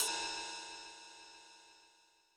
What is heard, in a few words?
Ride A#5, Roland U220